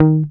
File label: D#2 GTR TRIK; Univers Sons; Jazzistic